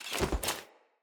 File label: Grunt Foley Roll; Enemy; Sinecure; Melee Grunt